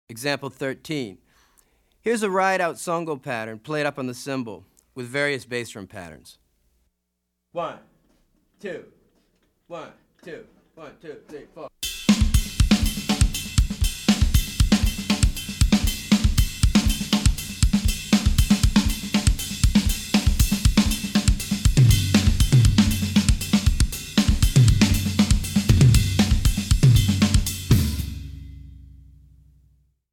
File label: Afro-Cuban Grooves For Bass And Drums